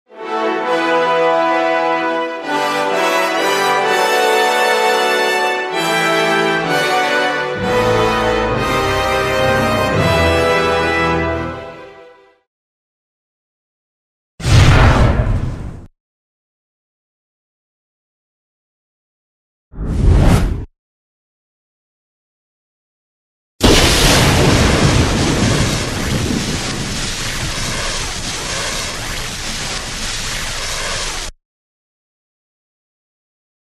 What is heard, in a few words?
Star Wars Force Power Sounds